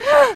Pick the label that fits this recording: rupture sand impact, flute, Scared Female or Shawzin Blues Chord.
Scared Female